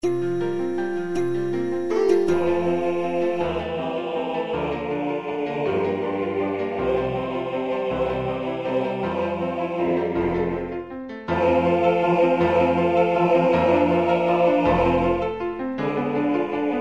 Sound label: Mozart laudate bas; Laudate Dominum